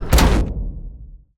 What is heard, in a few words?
annihilation mech mechanical texture; robot; Bluezone Corporation